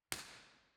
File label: Scala Mono